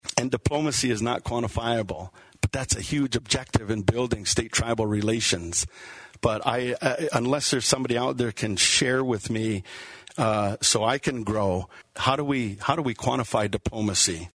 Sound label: Flute